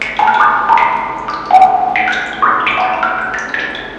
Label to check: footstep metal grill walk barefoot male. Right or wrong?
Wrong